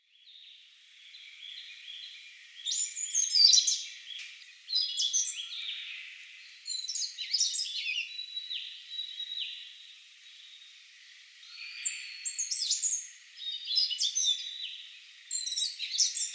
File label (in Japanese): kosame, nasushio, koe